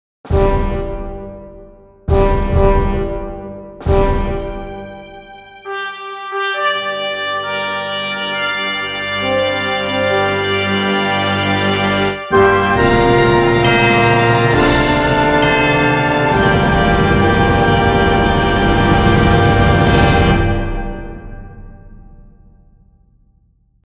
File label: fanfare intro